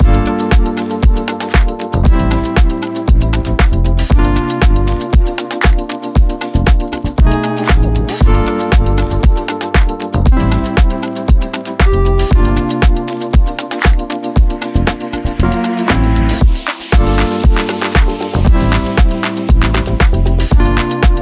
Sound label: smooth jazzy vibes